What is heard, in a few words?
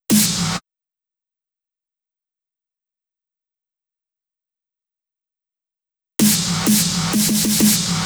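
Drum Loop snare Big, 128BPM; VEC5 Drumloops; VEC5 Multi Loops 128BPM; Loop Elements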